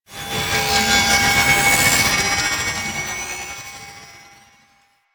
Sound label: Dash Loop; Warframe; Lasrian; Lasrian Soldiers; Abilities; Butcher